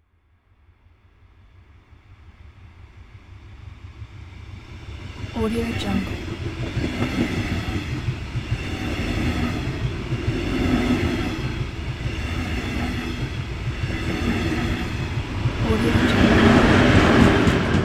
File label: fast electric train passing